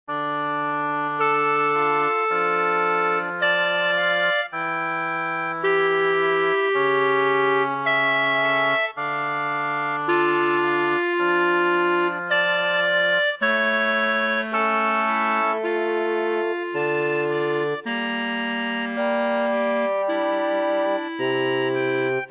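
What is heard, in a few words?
Sanctus Soprano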